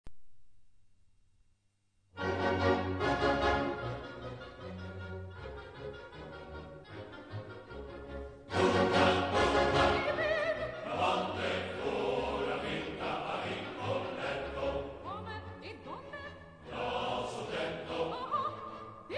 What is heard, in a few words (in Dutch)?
Hovelingenkoor; Rigoletto